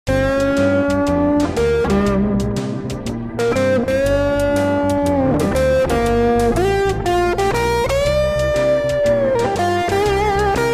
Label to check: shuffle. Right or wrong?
Right